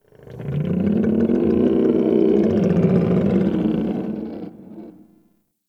Creature Growl; Warframe; Tau

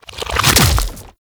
Limb Attach; Octopede; Warframe; ManInTheWall

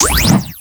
Fire; Lato Prime; Weapons

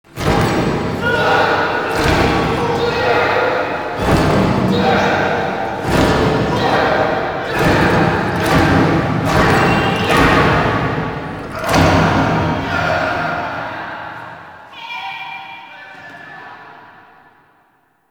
Orbital Station Classroom Chant; Warframe; Tau

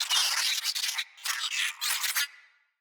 Space Drone Enemy Spotted; Enemies; Corpus

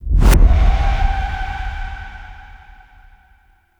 Wake Up Intro Stinger; Sacrifice Arsenal Wake Up

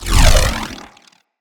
Voltaic Sword Projectile Launch; Characters; Enemy; Abilities; Sinecure; Banneret

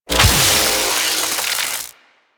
Electricity Shock Burst Fire; Oxium Osprey

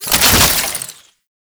Soldier Zipline Stop; Enemies; Gameplay; Warframe; Lasrian Soldiers

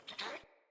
Trapped Hare Call; Encounters; Animal Rescue

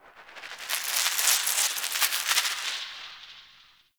Cave Squelch Bat; Warframe; Tau

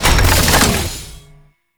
UI open; Veilbreaker; Kahl Customization

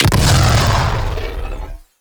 Arch Gun Alt Projectile Explosion; Weapons; Warframe; Archwing; Hildryn

